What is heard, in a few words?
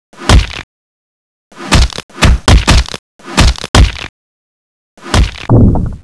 garg combat